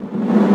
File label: One Shot, Hits